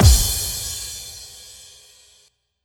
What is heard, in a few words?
Main Room Stacked Hit; essential drumkit